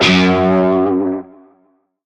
Shawzin Flourish Oct One F Sharp; Music; Warframe; Narmer Shawzin

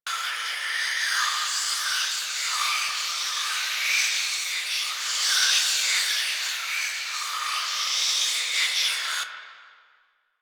SFX Choice Whisper Loop; Warframe; The War Within; Mountain Pass Return Peak; Cinematics

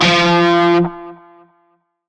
Shawzin Oct Two F; Music; Warframe; Narmer Shawzin

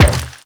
Step Metal, Footsteps

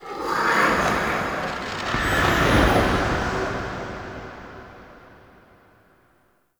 UI Boss Stinger Hard Mode; Hud UI

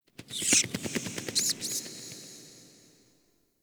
Interior Cave Bat; Warframe; Duviri; Ambience

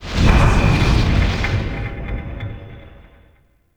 Zariman Void Storm Hull Impact; Ambience; Warframe; The New War

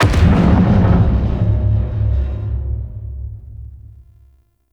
Ship Damage Explosion; RailJack Hit Effects

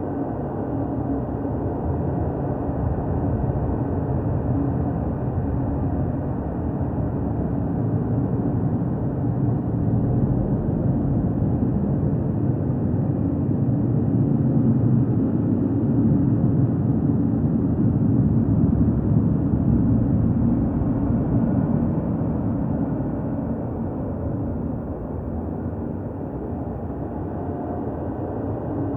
Space Amb; Tenno; Exterior; Sector Wars

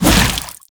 Shield Swing; Shield Lancer; Warframe; Lasrian Soldiers; Weapons

